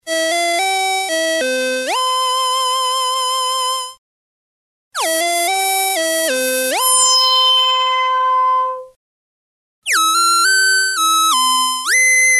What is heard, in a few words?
Logo Moog Cuts; WAKY Logo Custom Moog Cuts